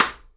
SIDE STICK, Live Snares; PlatinumPhattCD1; E-MU Producer Series Vol. 8 – Platinum Phatt

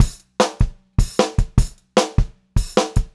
152 Pop-Rock Drumloops; USB Soundscan vol.37; AKAI

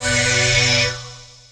chords

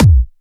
Trance Kicks

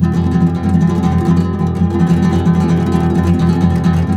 F# M, Guitars; Spectrasonics; Hans Zimmer Guitars VOL-2; FLAM TREM CH

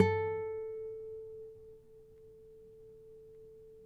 NYL A, FLAMENCO NYL; Spectrasonics; Hans Zimmer Guitars VOL-2

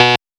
LOOP, DRUMS & PERC

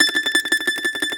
MAND TRM, Mandolin

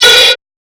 Hats, Alesis Performance-Pad

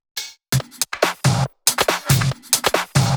Loops 140 BPM Hihat Percussion Loops

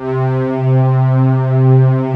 S STRING PAD; Z1 PADS; Pro-Rec - Synthsonic

